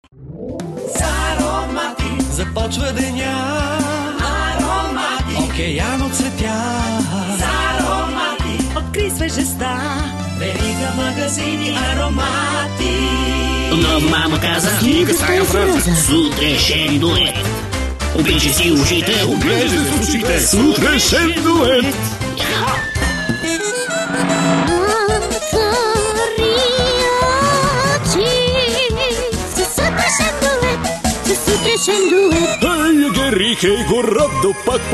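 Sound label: Bulgarian, male, singing; voice over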